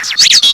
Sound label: WOOO, Fairlight CMI SFX